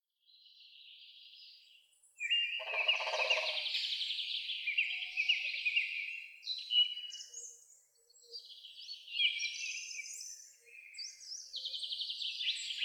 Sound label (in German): Spechte Picidae; Vogelstimmen